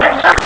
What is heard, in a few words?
lion; ST-XX Amiga Soundtracker Sample Packs